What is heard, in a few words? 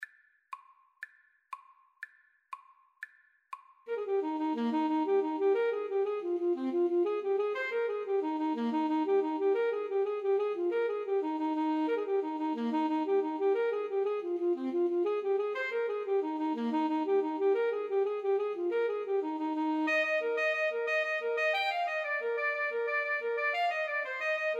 irish jig medley, clarinet saxophone duet